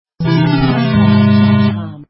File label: Lose; Kung Fu Feet Ultimate Soccer; Android